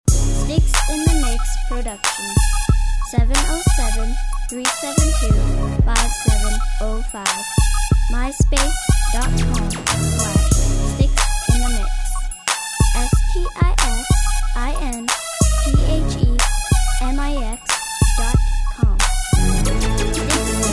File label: 90s crunk